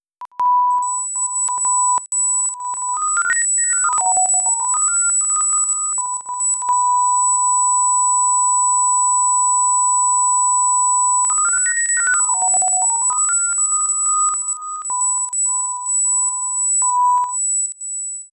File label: Agonal Rhythm